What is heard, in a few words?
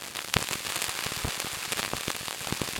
Crackle